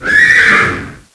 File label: Centaur Roar; Playable Tutorial Level; TR1